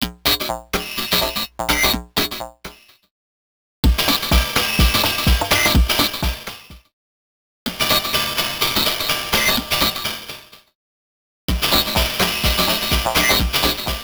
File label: Electro 126bpm; X-Static Goldmine 5